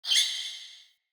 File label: Player Floppet Vocal Single Squeak, Animals